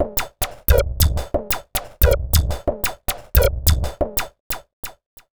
rolling 1 090bpm, downbeat dance grooves; Zero-G

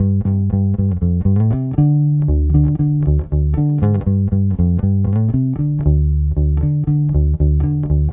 Bass, 70s Analog Funk

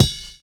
SNAP N CL; Univers Sons - Kitch 70s

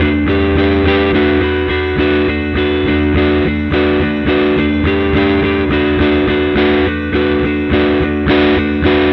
Guitar, Indie Rock; Sonic Foundry (Sony Creative Software); Indie Rock Anthems

